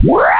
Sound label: under attack